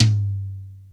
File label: TOM L; Crypton - DrumBase5000